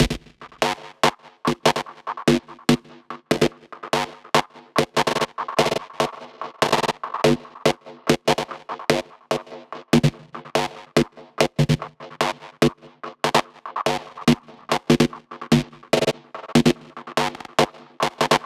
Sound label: Lead Line G 145 BPM, Psytrance; Lead Lines; Stray